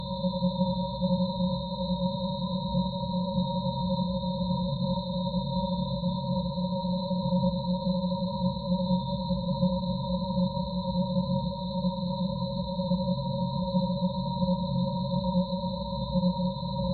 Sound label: Haunting Space